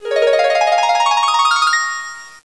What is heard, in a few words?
WAVE